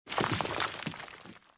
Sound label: dirt, ambience; Star Wars Jedi Knight II Jedi Outcast; XBOX; artus